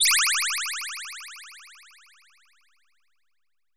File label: SPACE SE; AKAI S6000 CD-ROM - Volume 6